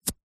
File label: office blue tack adhesive putty pull remove from surface